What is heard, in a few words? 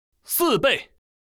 Chip